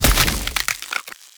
frost freeze

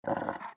wolf breathe run

